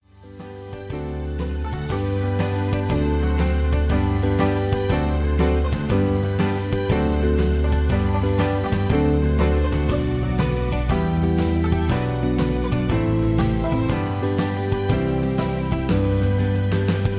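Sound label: Traditional Irish Songs - Karaoke Track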